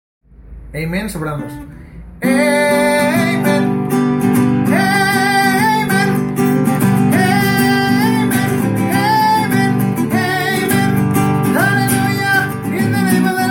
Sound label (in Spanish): amen voz soprano